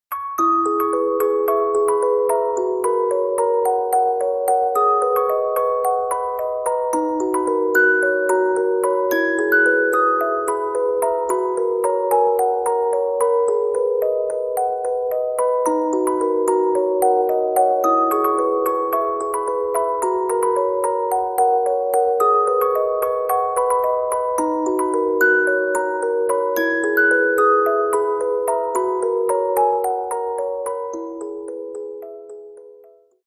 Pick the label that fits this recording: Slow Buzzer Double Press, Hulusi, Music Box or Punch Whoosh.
Music Box